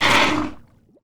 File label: leviathan dam gup; monster